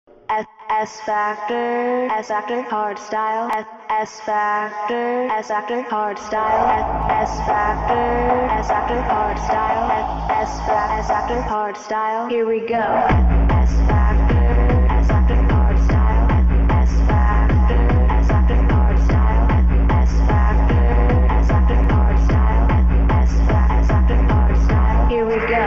hardstyle ringtone

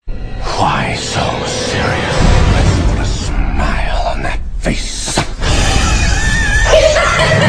sad ringtone

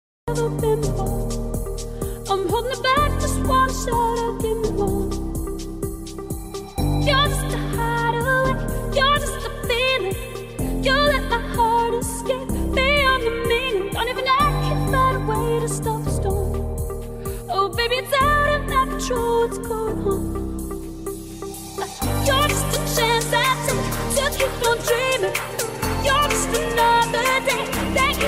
remix ringtone; english ringtones

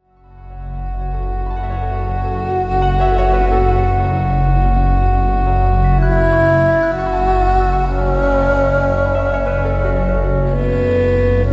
sms galaxy s4 ringtone

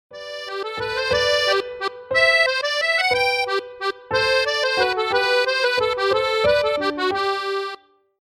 menuet classical ringtone

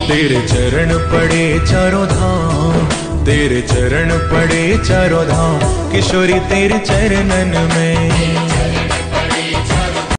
hindi ringtone, bollywood ringtones

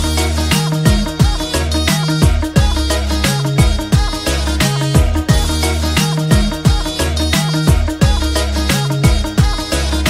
punjabi music ringtone

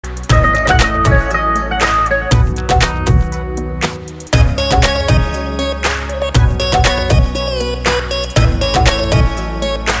music instrumental ringtone

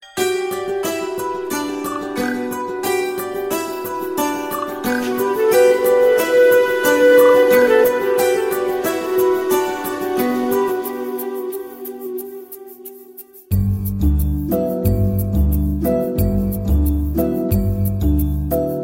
flute rhythm ringtone

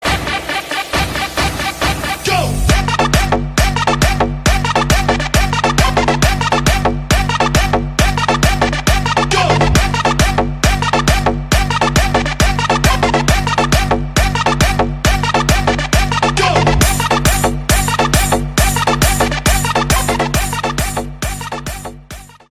sad sound music ringtone

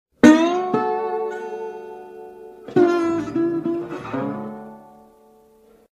blues lick ringtone, sms; samsung sms ringtones

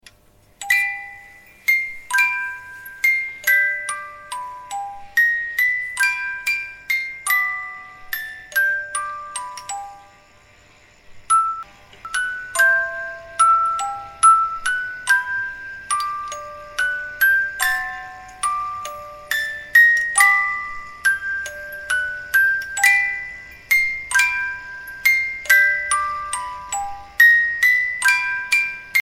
vintage wooden dutch windmill music box ringtone

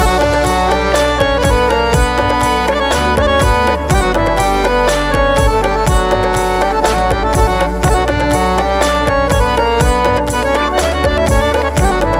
punjabi music ringtone

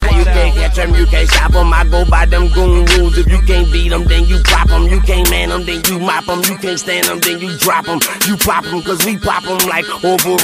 country rap ringtone

